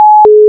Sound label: Alert